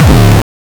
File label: 909 Dist Reso Crushed - C#2, Kicks; Drums; Mbase 11 Kicks